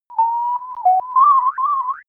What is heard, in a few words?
whistle